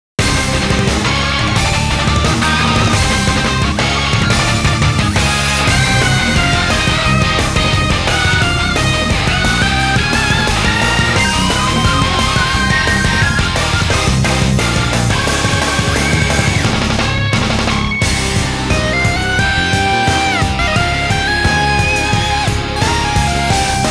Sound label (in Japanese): BGM6 ボス戦; general gear sound collection vol.1